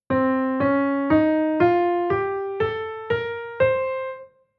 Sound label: Chord mode C Dorian b2